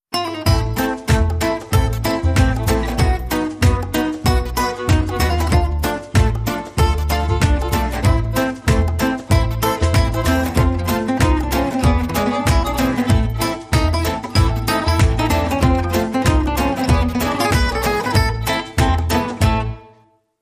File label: jingle